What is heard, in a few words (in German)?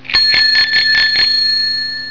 klingel